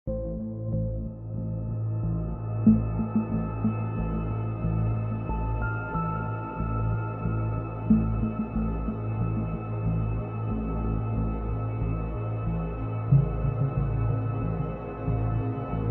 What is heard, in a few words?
ambience infection